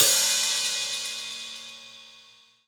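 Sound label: open hi-hat; eddyflux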